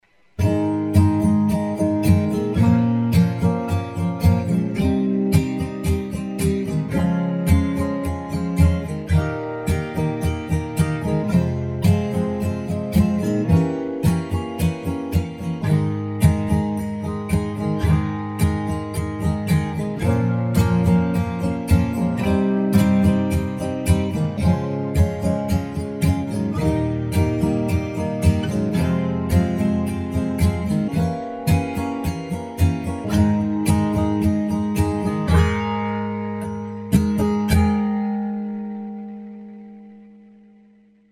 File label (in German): Ab Gis Dur Akkorde